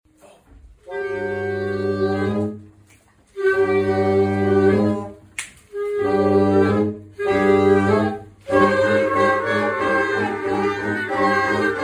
orchestra wind

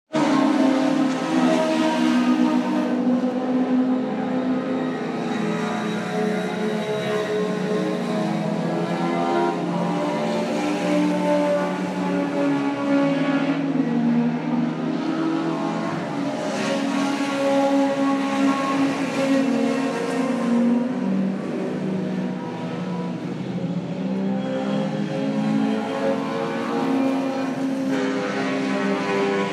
motor sport

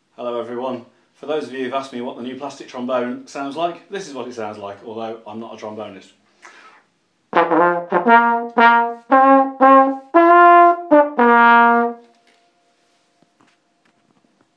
tuba player meets plastic trombone

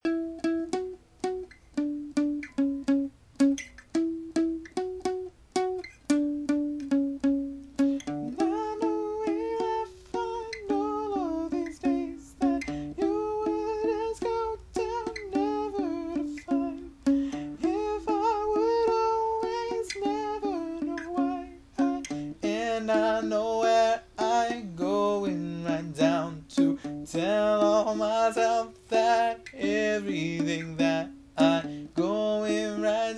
falsetto

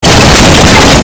a short burst of saws

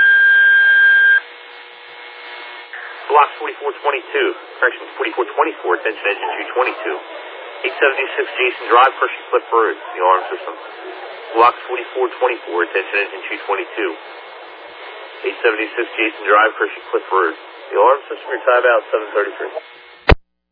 alert page received; bensalem newport

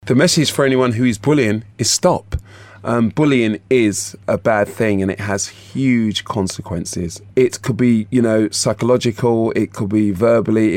charity choir; grenfell

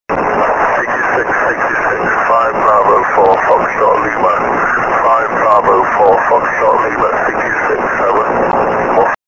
6m ssb

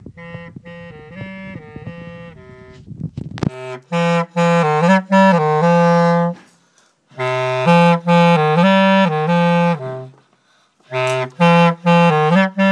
bass clarinet

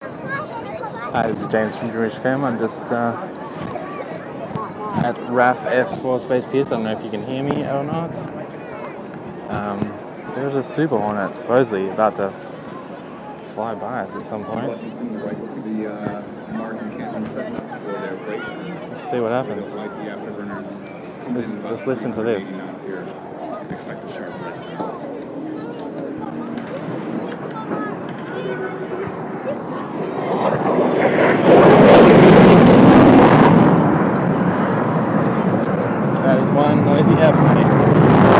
super hornet flyby at raaf pearce

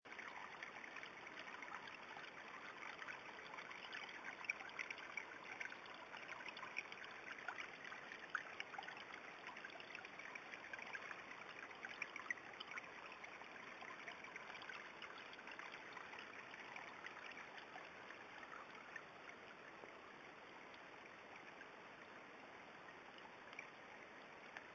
babbling brook

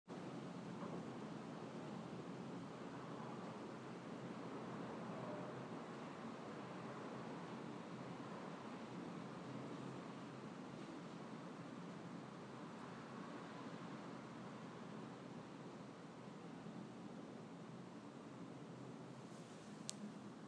lound wind

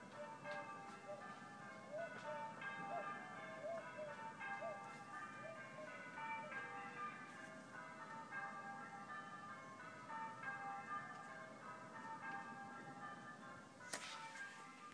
olympic bells; cherry hinton cb1; olympics

